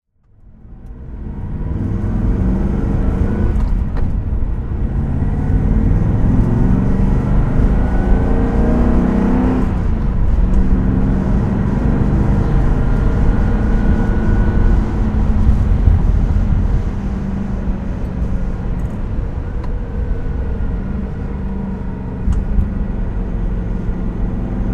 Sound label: alfa romeo montreal drive